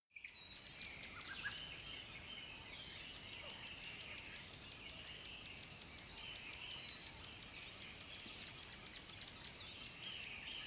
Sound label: river dawn insect close, dschungel regenwald; atmosphaeren umwelt